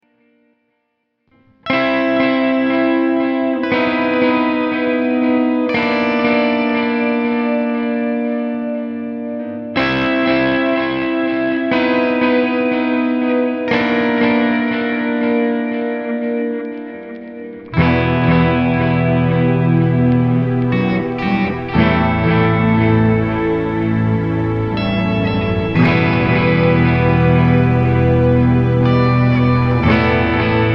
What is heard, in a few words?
Epiphone